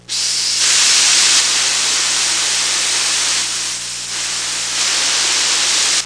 pressuri, house

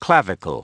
clav; The Ultimate Human Body 2.0; Dorling Kindersley

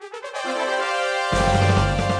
victory; PC Gamer IT CD 32; XCOM

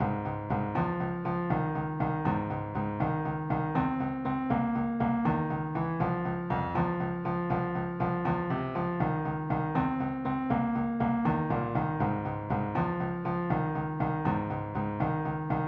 blues; 3800 MIDI Magic

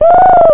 SEAGULL; da capo vol1; speech animals

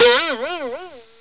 BOING

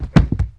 fall thud, impacts

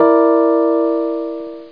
E Piano; Abaccus

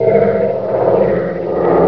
attack; Hexen II; medusa

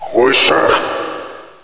growl; HEXEN2; archer

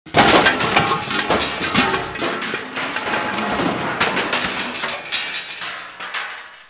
Various Metal Pieces Dropping; How Many Bugs in a Box

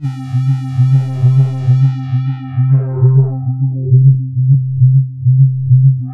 fall, Synth

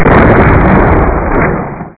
Magic; Szenario

